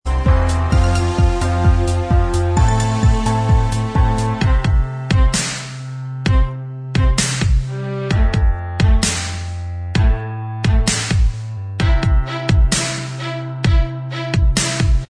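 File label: instrumental track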